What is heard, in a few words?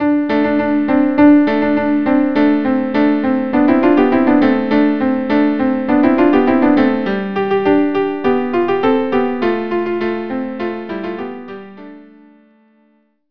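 violin violin; Debka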